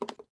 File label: fs glass, footsteps